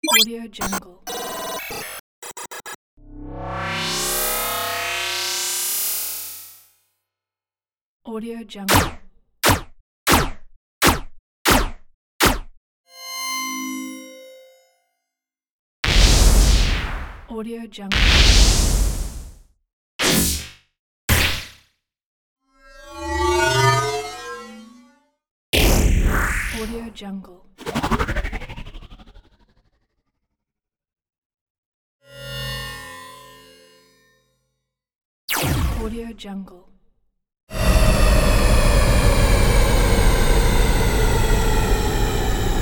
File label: futuristic sound pack